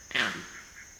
krak-oo, campbell; vocalisations; compcog

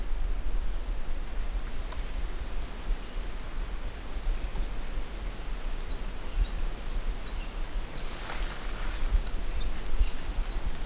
Cat in the canopy, titi monkeys; vocalisations; compcog

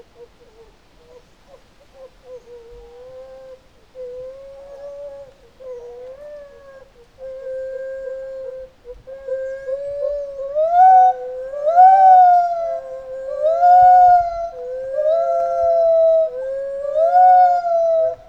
clouded leopard; vocalisations; gibbons; compcog

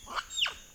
diana vocalisations

